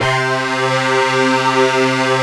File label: SYN, 160 BPM, Drum & Bass